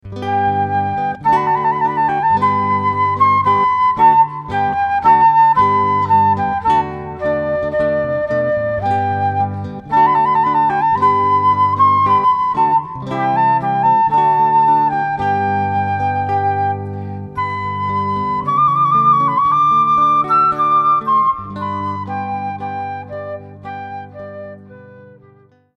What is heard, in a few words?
Trumpet Voluntary Duo